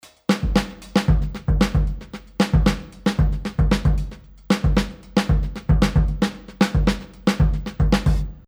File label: Drums 50S DRUMMER; NI; ABBEY ROAD